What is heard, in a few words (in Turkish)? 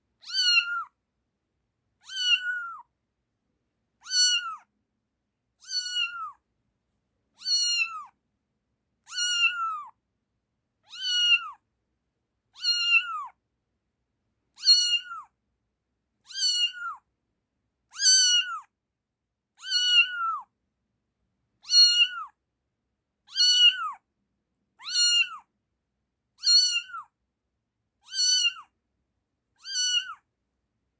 yavru kedi sesi